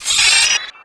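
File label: droid spyder hit; Star Wars Knights Of The Old Republic I; XBOX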